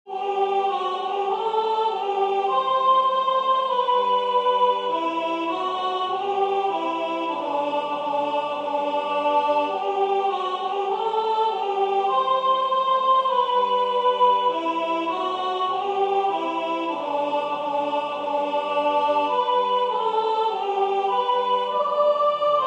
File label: Soprano and Full Choir, choir practice files; For the Beauty of the Earth; chalice choir